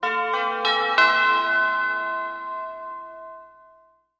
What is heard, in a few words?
Music Logo; Tube Bell Chime For Announcements.